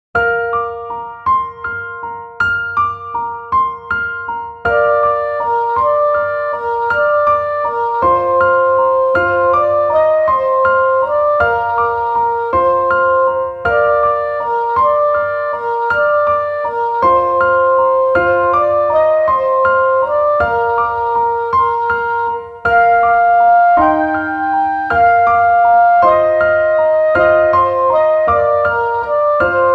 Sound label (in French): trp sax eupho, musiciens